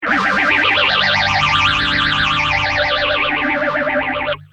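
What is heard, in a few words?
guitar sph323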